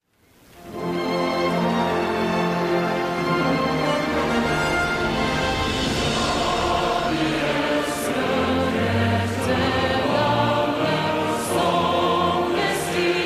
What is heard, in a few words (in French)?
Hymne National de Norvege